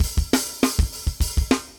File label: brute, metal